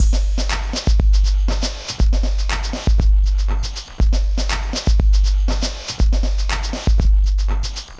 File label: Metallised Beat; Sonic Foundry (Sony Creative Software); Myths of Technology; Composite Beats